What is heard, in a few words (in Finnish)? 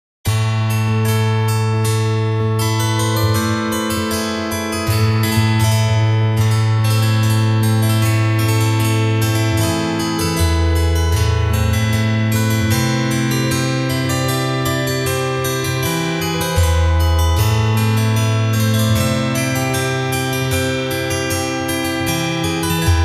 harpa